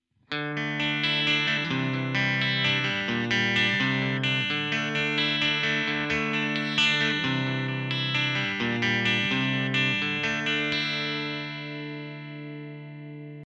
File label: clean phone